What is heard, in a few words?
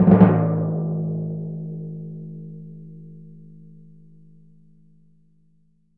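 timpani A#, Synclavier Percussion; ILIO; Synclavier Percussion 2; World & Orchestral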